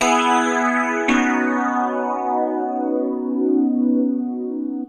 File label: Synt; FUNKACID3